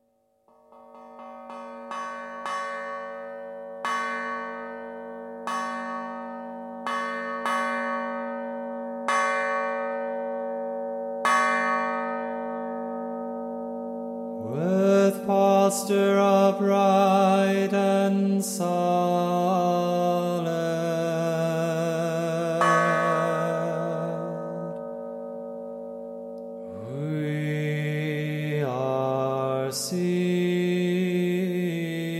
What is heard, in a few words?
evening chant